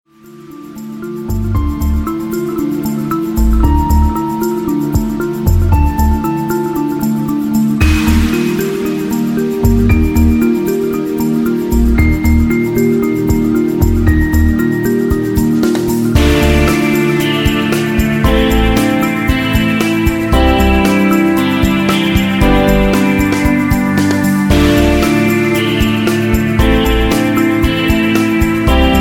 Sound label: ambient lounge ringtone